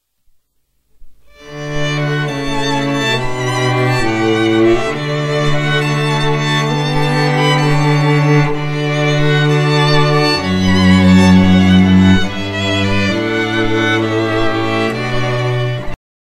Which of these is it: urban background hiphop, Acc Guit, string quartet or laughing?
string quartet